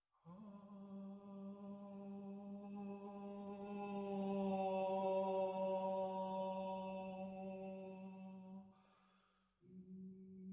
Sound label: Bagatelle For Clarinet & Piano